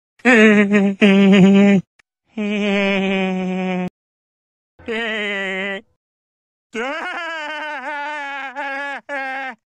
laugh hehehehehehe; peter griffin

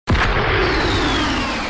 fly sound; goku black

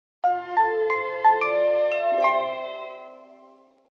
entertainment jingle

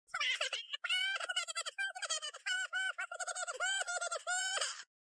crying sound; sago mini phase 3

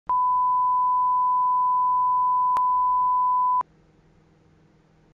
beep censored sound

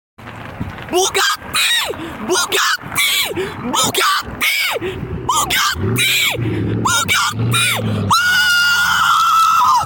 bugatti; bugatti excitet

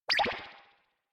alert; wiggs

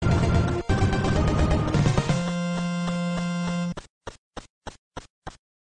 ending stage jingle; super c

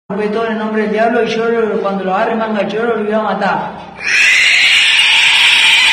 man in jail screeches

